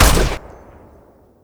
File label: m4a1 unsil